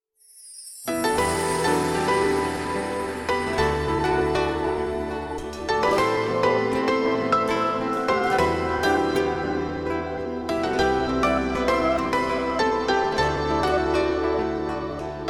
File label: E minor bpm 100